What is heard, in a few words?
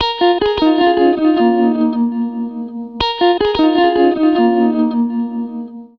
Bb7, 150, Guitars; Zero-G; Total Drum Bass